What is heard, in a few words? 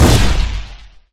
Plasma Explosion